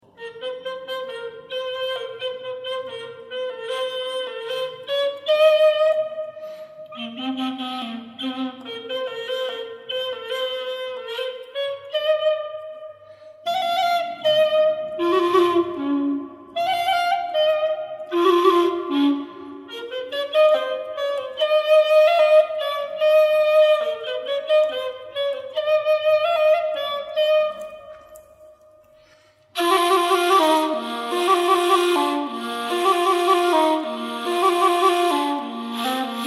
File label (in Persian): نی; Dogah; چهار مضراب بیات